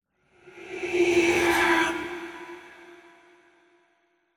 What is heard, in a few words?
Whisp Tense; horror and atmosphere fx; Silent Hill Downpour